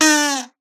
kazoo short fall; soundscrate

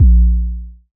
electronic kick tone; soundscrate

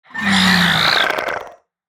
raptor scream and rattle; soundscrate

